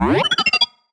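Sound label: Sci-Fi Droid Robot Emote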